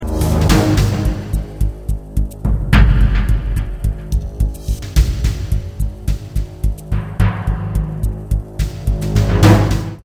intruder

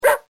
dog bark

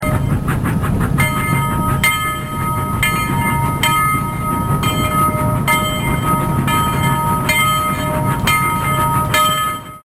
Train

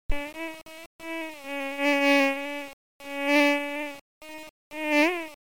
mosquito

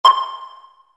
ping

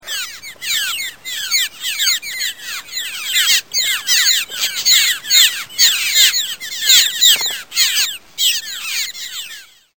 swallow cliff colony

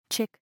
chick

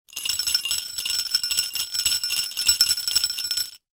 jingle bell

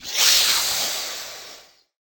croc die

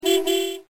horn

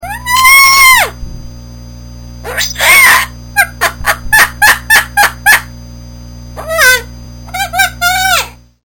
Chattering

